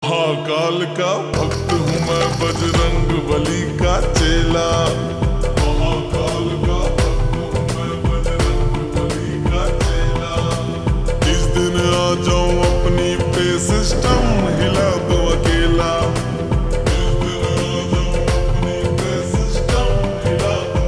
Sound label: Slow Reverb Version Ringtone